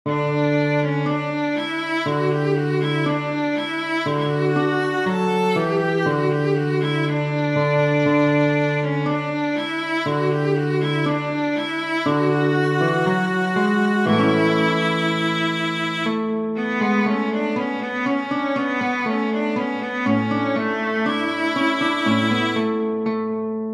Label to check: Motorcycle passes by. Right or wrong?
Wrong